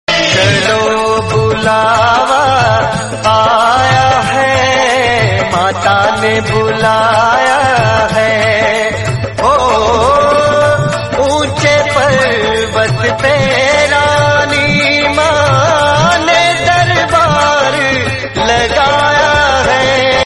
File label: devotional